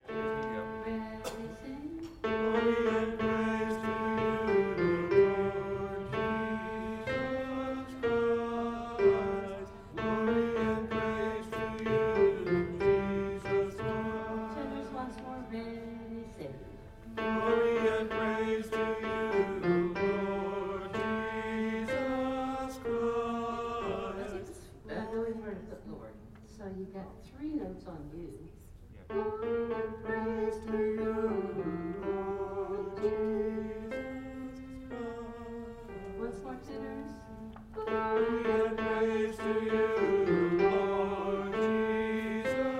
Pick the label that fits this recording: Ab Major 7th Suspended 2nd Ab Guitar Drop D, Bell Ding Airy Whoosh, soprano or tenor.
tenor